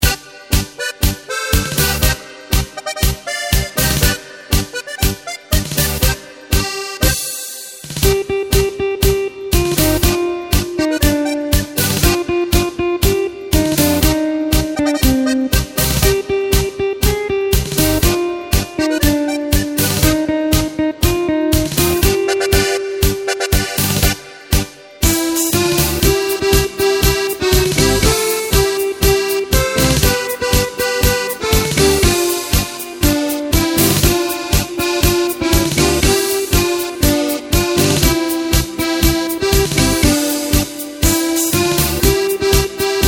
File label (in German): Tango